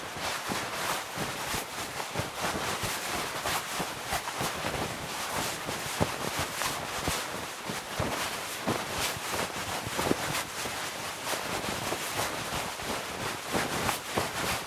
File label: other run, clothing system